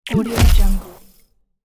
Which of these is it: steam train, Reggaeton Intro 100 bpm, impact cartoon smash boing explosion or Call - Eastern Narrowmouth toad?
impact cartoon smash boing explosion